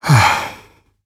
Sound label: Emo Sigh